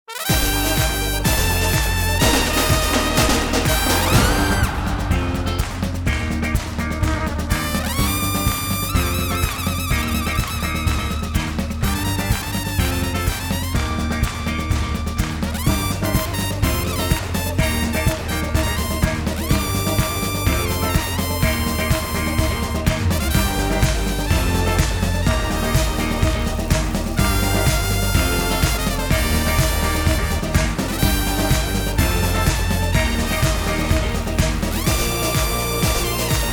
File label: Brazilian Sting into Bed; Good Morning Britain